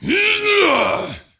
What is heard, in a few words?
Attack Heavy; Varimathras